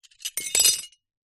plate dish ceramic broken pieces set down on ground